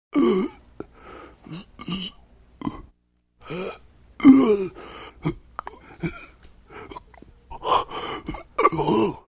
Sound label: Gasping-Straining to Breathe Male Softly Choking Close; Human Sounds